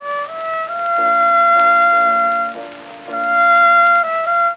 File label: Carnival of Venice variations; loc-edison